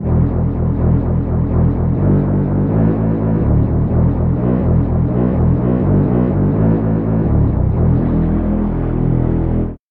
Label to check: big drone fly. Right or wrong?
Wrong